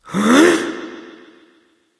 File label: breath; smite; nezha